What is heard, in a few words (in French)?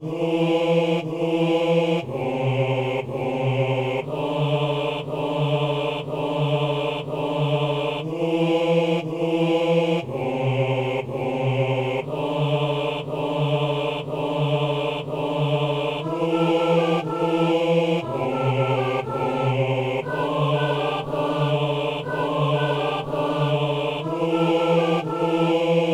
basse; Messe de Saint-François-Xavier - Sanctus